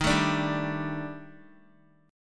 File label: Eb Major 7th Flat 5th Eb Guitar 4F 4A 5C 5G 5C 6E; strummed; x,6,9,7,7,x